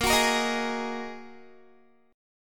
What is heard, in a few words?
Bb 7th Suspended 4th Sharp 5th, Guitar; Bb7sus4#5 strummed